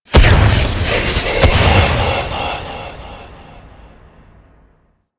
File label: Spawn; Ambience; Orokin Moon Stalker; Warframe